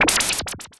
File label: Effects; Zisch; Ableton; Creative Extensions; Poli